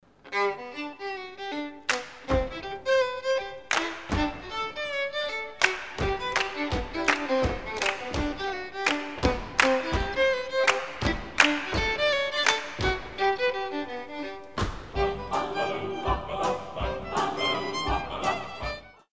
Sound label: Hambo Swing